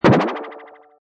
Cartoon Tymp Bend Warble, Tympani Bend Warble, swirling boing sound; Comedy/Cartoon; Funny Sounds